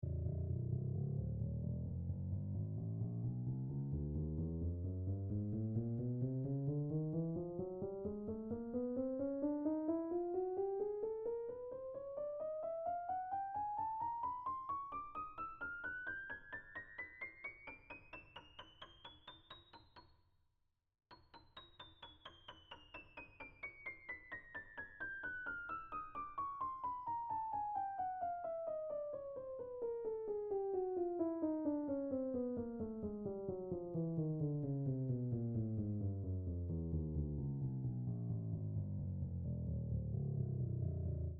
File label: scale, Salamander Grand Piano; NoctSalamanderGrandPiano